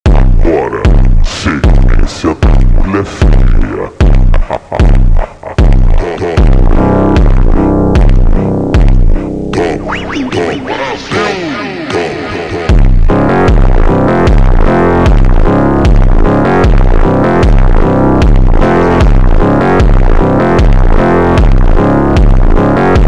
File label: slowed phonk